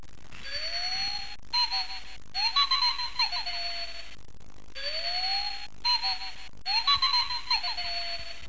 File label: clanger